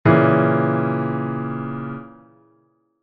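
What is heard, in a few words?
A♯ diminished chord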